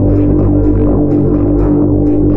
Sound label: bgloop